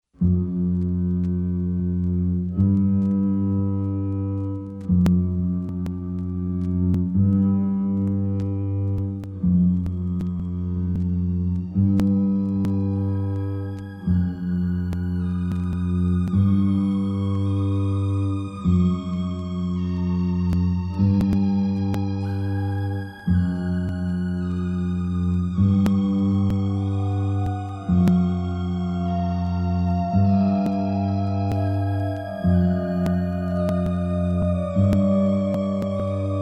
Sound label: Suspense Music (Electronic Stereofect)